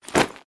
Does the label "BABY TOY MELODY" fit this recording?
No